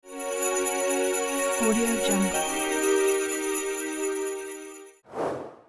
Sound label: magical christmas sleigh bells transition